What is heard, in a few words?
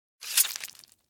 sploutch